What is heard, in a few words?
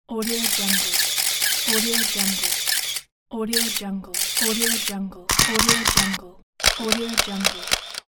photo shooting